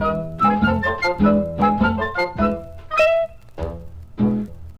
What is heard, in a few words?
keys chop, jazz loops